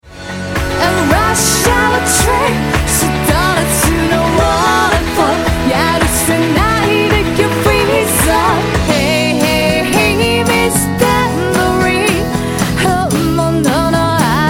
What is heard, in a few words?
GUITAR HAT